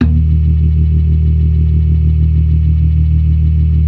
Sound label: ORGAN, 4 DRAWBARS; Keith Emerson Signature Series